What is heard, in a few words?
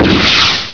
rocket; hurrican